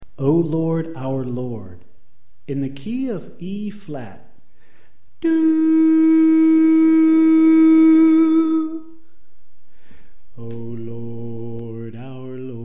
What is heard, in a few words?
Soprano Left